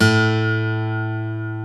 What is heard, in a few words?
PICK MART